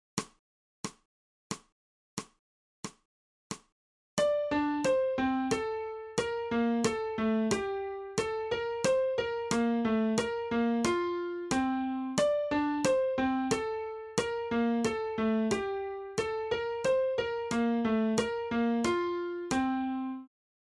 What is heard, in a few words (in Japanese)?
新フレットレス